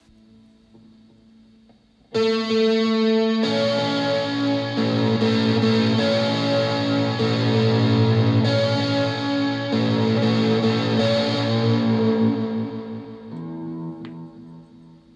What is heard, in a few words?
small riff disto